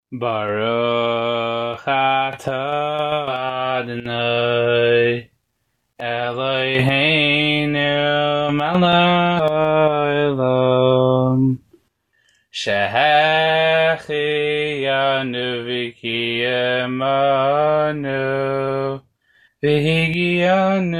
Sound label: shehechiyanu